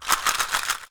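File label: SHARK CALL, ethnic percussion; WorldSounds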